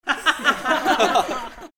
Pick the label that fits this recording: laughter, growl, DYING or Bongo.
laughter